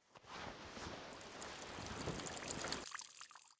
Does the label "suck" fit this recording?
No